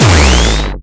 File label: beam lazer